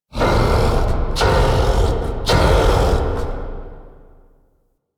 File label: Start Wave; Warframe; Entrati; Mirror Defense